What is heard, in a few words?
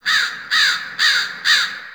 Fall Crows; Warframe; Ambience; NineteenNinetyNine